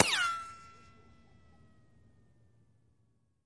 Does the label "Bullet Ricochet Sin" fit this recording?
Yes